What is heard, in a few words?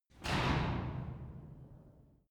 garage door close short soft